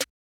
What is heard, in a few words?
Open Snares